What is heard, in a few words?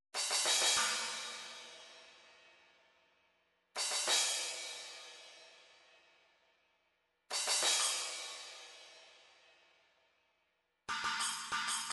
drums, midi